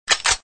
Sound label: bolt; Gun Mayhem 2